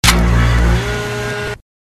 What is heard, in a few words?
railgun Sound